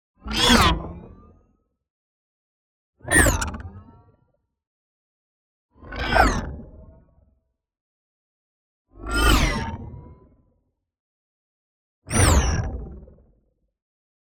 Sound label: NEON WHOOSH SMALL FAST Metallic Screams; boom library